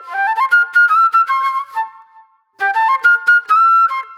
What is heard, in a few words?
Disco Flute